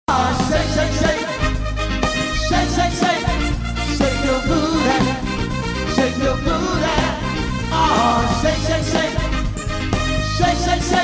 Wedding Band; Boston

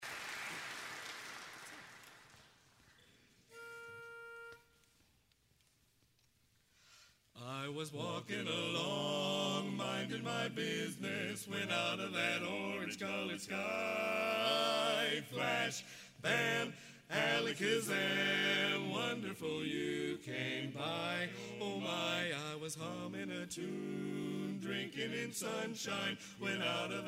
Barbershop Quartet; Atlanta

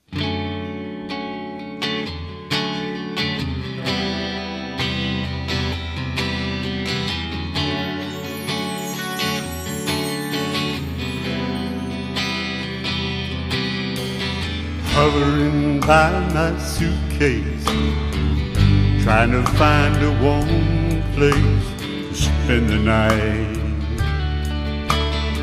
50s 60s Band; Winston-Salem

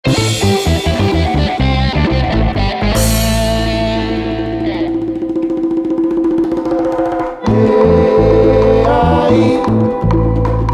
Latin Band

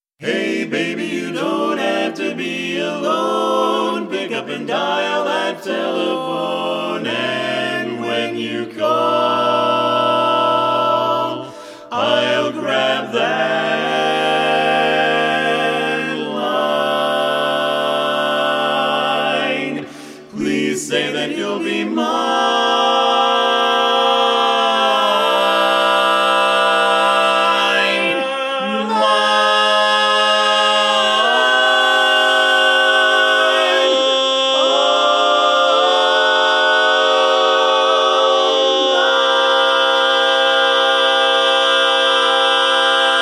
Barbershop Quartet

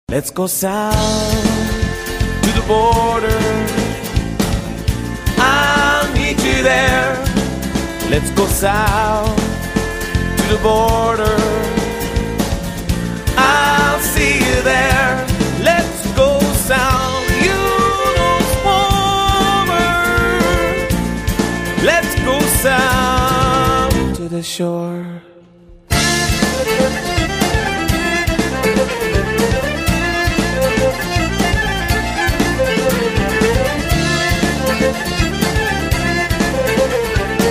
Irish Band

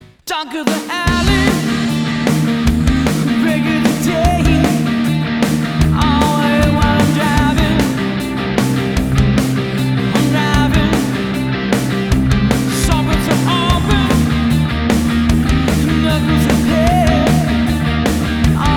Cover Band; Orlando

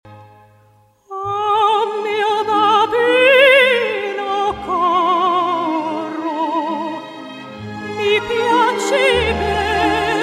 Opera Singer

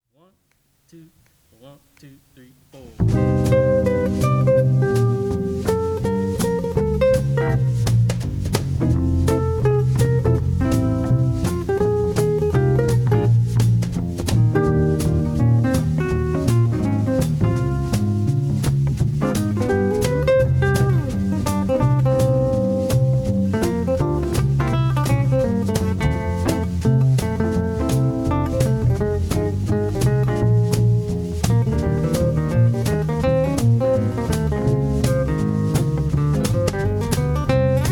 Jazz Band; Albuquerque; newly formed jazz quartet made up of seasoned musicians in Albuquerque, New Mexico

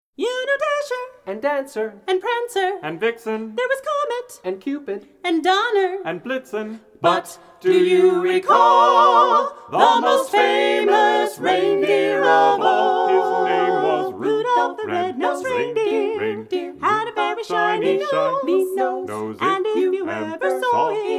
Carolers

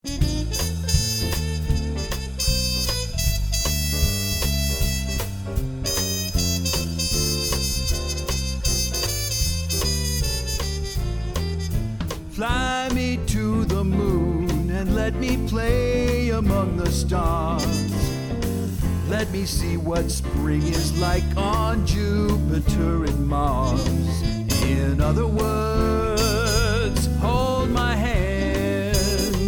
Cover Band

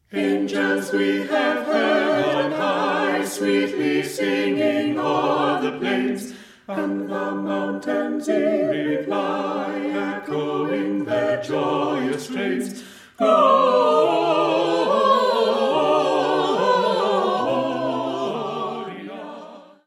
Carolers; Minneapolis

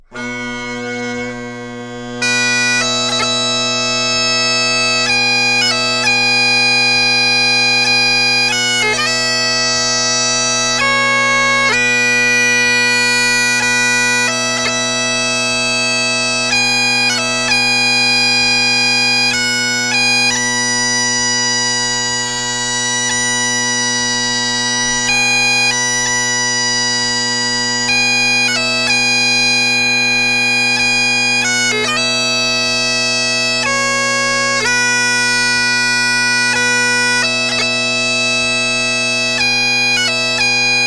Bagpiper; Los Angeles Bagpiper

